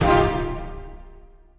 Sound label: Train Miss; dragon mania legends